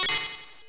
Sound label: Extra Life